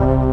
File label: DM ORG1, Dance Mega Synth; Best Service; 90 s Sample CDs